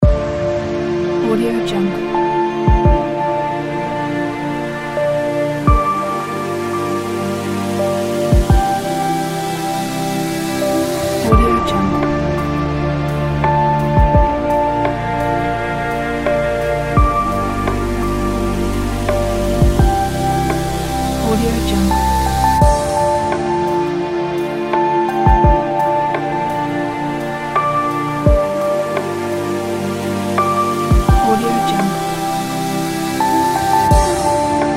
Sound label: trip hop relax nostalgia